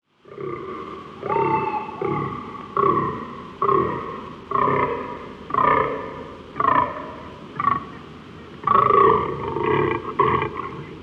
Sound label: Fallow Deer Dama dama